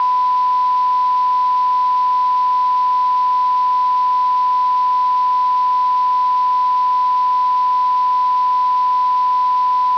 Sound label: IMA 1kHz 12.00dbFS